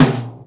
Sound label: Ludwig Hi Tom